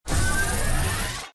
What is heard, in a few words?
rocket